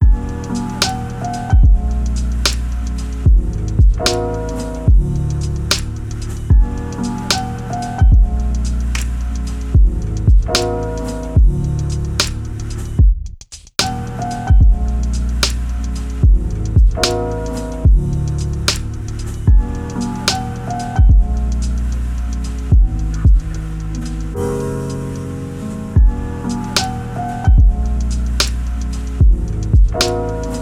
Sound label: lofi hip hop